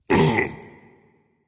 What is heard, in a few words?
surprise; smite; voicepack; xingtian